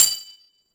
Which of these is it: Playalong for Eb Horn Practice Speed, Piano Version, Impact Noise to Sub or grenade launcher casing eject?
grenade launcher casing eject